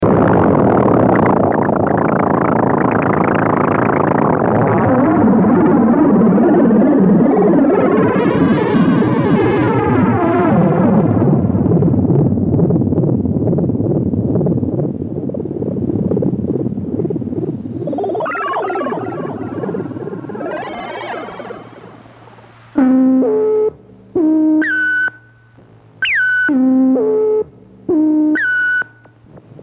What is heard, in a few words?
Buchla Box Music bees; Warners